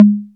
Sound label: 808 conga, Electronic